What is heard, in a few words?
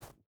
footstep rubber boots snow jump start; Rust